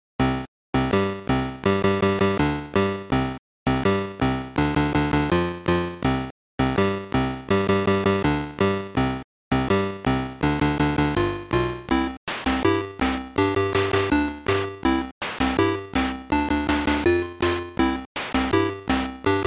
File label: reggae